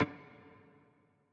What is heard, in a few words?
piano riff intro